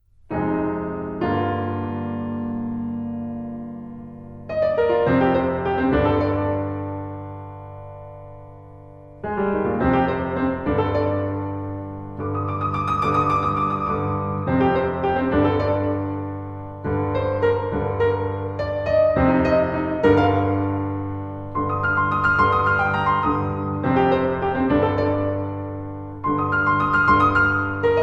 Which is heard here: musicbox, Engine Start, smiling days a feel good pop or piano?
piano